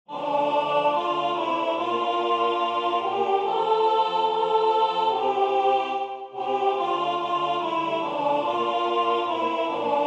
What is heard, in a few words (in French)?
Ne crains pas soprane